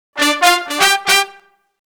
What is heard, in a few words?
BRASS LICKS, Disco Fever